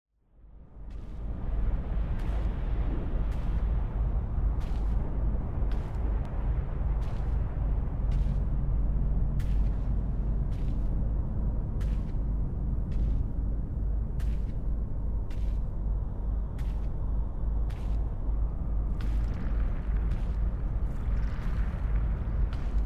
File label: soundscape; gris